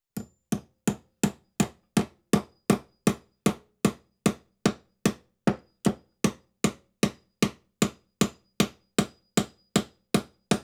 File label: Floor Nail Hammered (Slow Rhythm; Construction; BBC Sound Effects Library